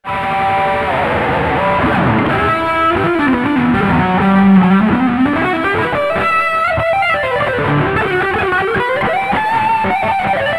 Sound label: SOLO